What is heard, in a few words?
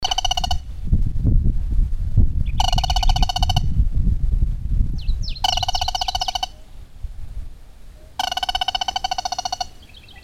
crake band bellied Porzana paykullii